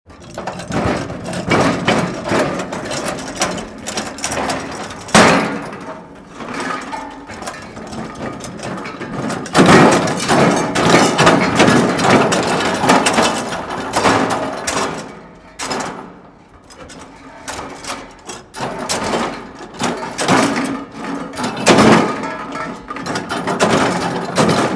Tieng cong nhan day xe day trong nha may cong nghiep

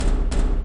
go canh cua sat 2 tieng

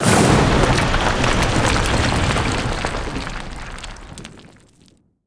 Tieng sung dai bac ban vao tuong thanh

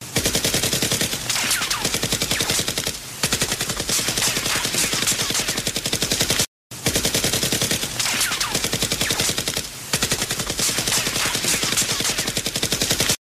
Tieng sung ak 47

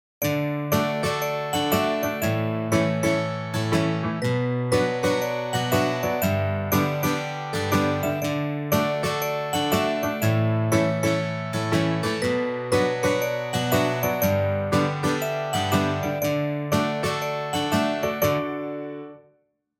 Nhac nen vui ve nghich ngom

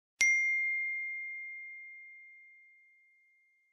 tieng ting ting chuyen khoan

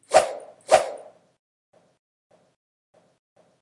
Âm thanh vẫy vẫy tay

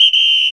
Tieng thoi coi nhac nho

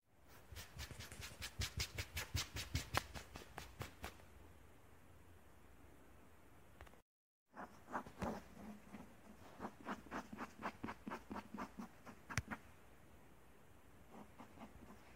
tong hop tieng cao xe tray xuoc

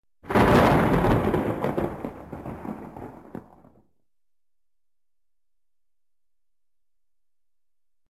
Tieng sam chop

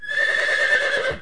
Tieng ngua hi, horse neigh; เสียงม้าร้อง; ม้าร้องตอนดึงบังเหียน

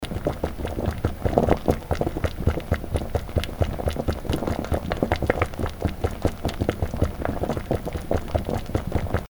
Tieng noi chao noi sup soi

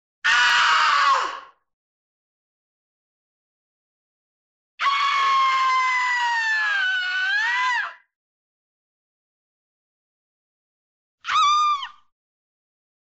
Tong hop cac tieng la het giong phu nu

